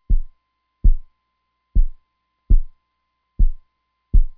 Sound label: medical